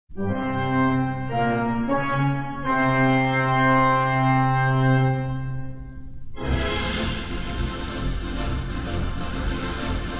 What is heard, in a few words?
theatre organ; mosque